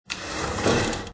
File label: open drawer oven